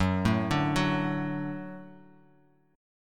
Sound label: F sharp Minor Sharp 5th, Guitar Half Step Down